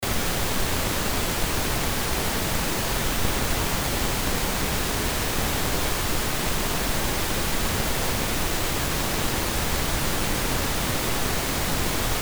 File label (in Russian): rozovyi shum pravyi; Magnitola Avtozvuk